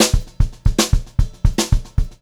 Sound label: 152 FILL, Brushed Drum Loops; USB Soundscan vol.39; AKAI; HOTROD